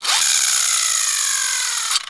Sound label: WIND UP TOY, percussive